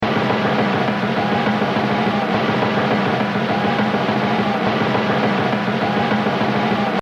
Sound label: Jazz Drumming